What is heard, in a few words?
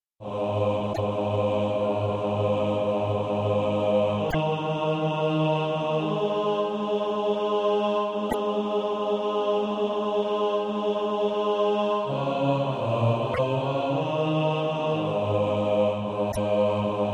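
bass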